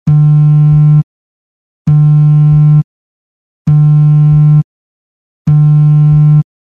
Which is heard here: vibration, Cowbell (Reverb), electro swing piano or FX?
vibration